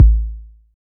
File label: Bass Hit, drumkit